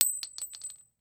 Weapon Bullet Shell 40 Dropping On Cement; AdobeCC

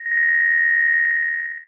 Science Fiction Sci-Fi Electronic Ringing Panning

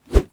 Sports Golf Club Swing

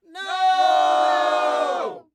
Human Crowd "No" Drawn Out

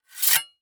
Weapon Broad Sword Slide Off Sword; AdobeCC

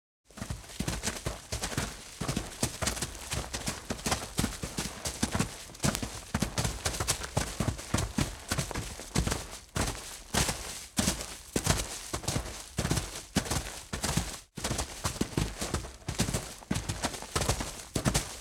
Group, Jungle Dirt Grass; Blackmagic Fairlight

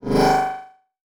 Multimedia Internet CD-Rom Flash Wipe

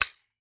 Foley Toy Dart Gun Firing

